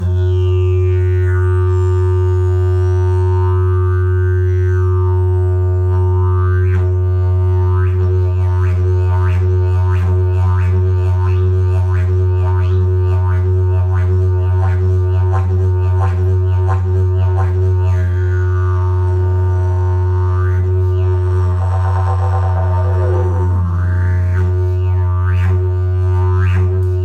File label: didgeridoo; jilm vruborez